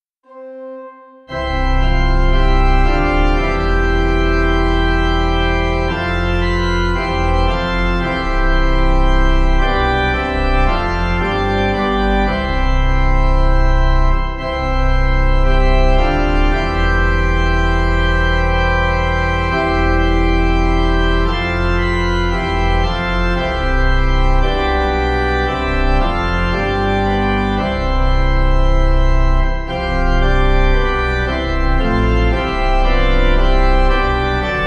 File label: Nunc Dimittis Pipe; Communion Service